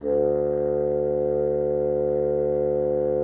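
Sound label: bassoon piano D2; Xsample VOL-6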